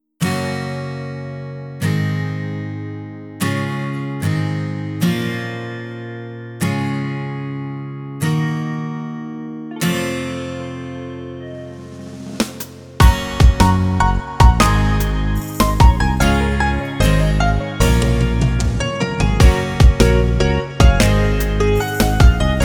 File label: jingle